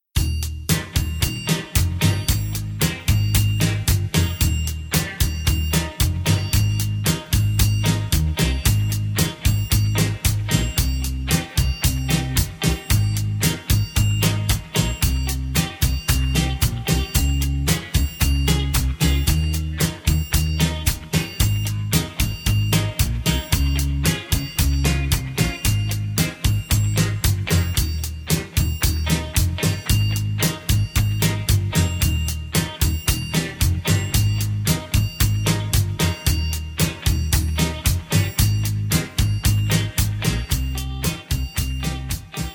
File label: (Ab) No Backing Vocals